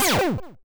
shot; pixel rocket

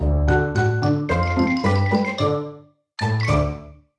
game over; stack jump

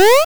jump; slime switch

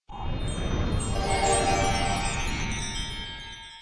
win; flying ball

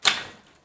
roll flip

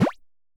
collide; mr pong

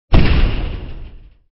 explosion; swipe it

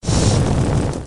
fire; hangman

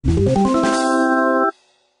level completed; traffic command

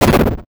block destroy; metroidvania

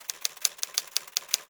typewrite; baseball bash

